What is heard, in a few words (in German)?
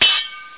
Schwert; PC Gamer IT CD 48; 1602 AD; 1602ad demo